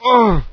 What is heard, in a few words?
pain; Rosenberg; Source engine